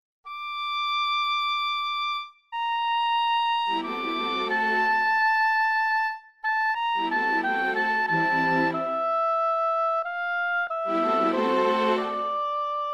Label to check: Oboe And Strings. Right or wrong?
Right